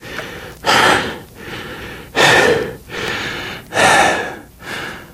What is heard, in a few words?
exertion minor, biker; source engine; left 4 dead 2